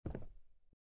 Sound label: step wood; gamerip; Out of Reach: Treasure Royale